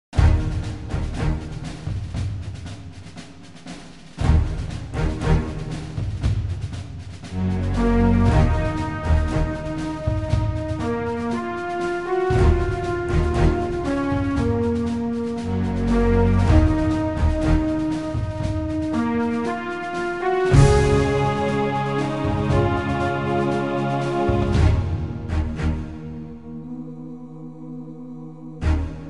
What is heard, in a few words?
music; commandos